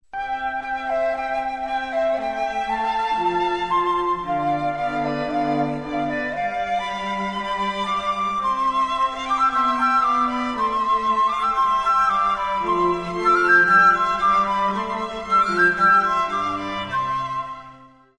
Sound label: Flute Trio; Sheep May Safely Graze Bach